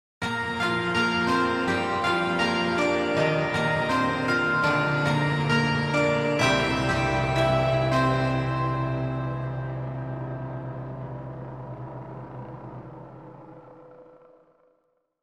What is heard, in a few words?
Attack Wide